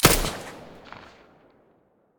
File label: silencer p90 stereo, weapons